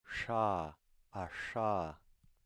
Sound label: voiceless retroflex fricative